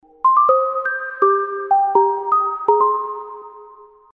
ringtone, apple sms ringtones; symphony

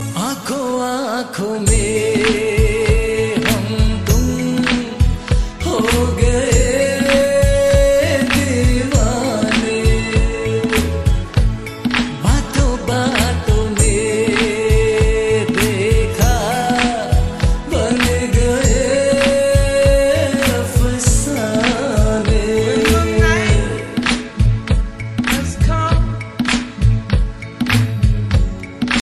hindi song tone ringtone, bollywood

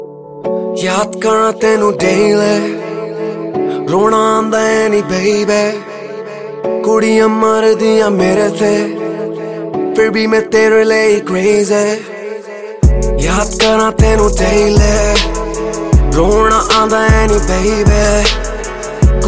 ringtone, bollywood ringtones

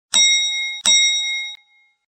door ring sms ringtone

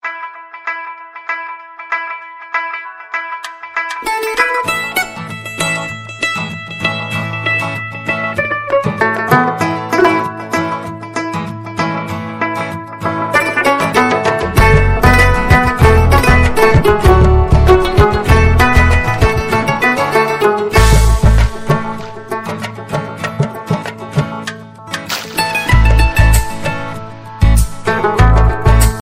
musical guitar ringtone; bollywood

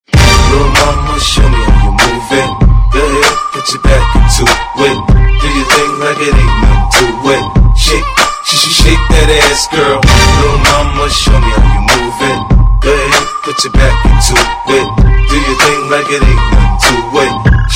hollywood ringtone; english ringtones

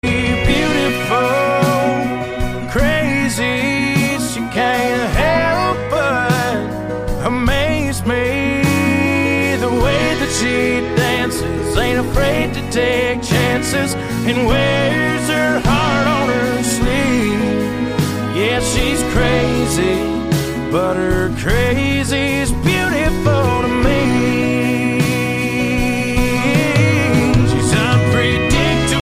english song tone ringtone

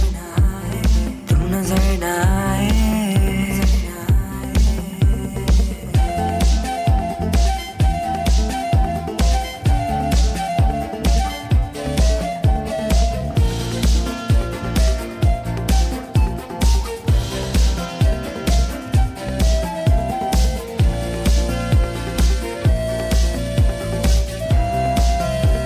hindi mix music ringtone

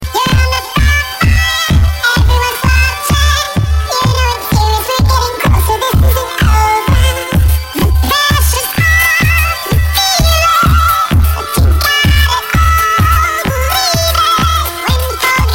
funny ringtone

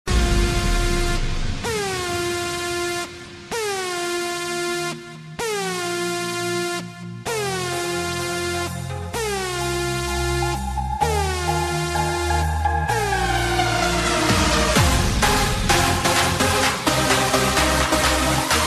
techno

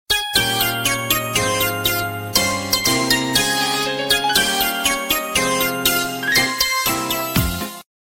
alert ringtone

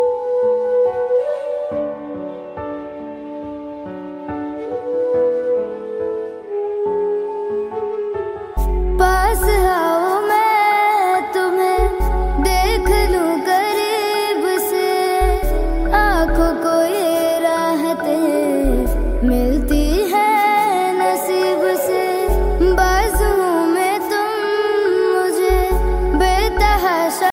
beautiful new love song ringtone

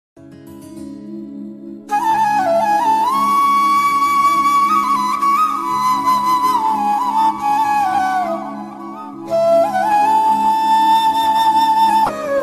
sad flute ringtone

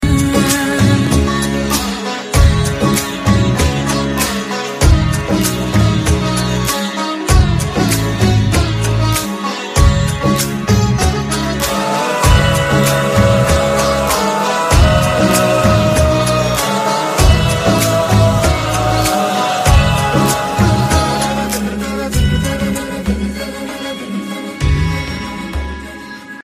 flute ringtone

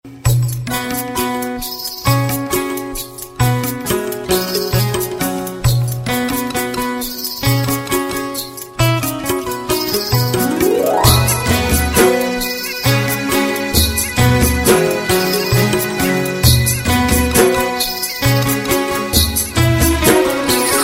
instruments ringtone

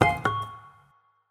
vintage ringtone, sms

